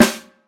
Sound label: acoustic snare sound a key, Royality free snare tuned to the A note. Loudest frequency: 1761Hz